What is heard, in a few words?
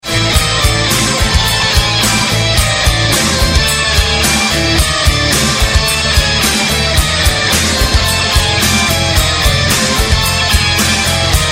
Rock N Metal